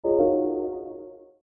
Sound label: click